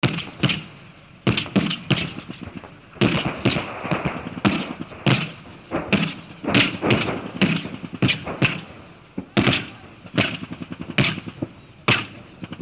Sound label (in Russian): minomyot vedyot ogon slishni drugie vistreli